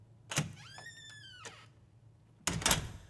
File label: Door open close